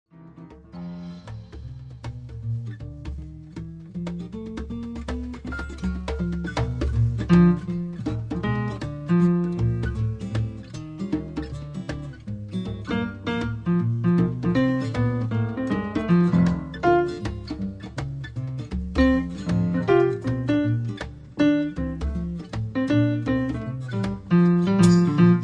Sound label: blues